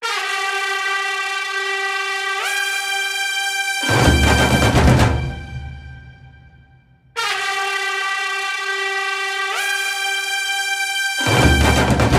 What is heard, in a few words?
Movie Entry Music Ringtone; Theri